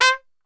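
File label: C3 trumpet shot, Brass Super Section; Best Service; TRP SHOT; 90 s Sample CDs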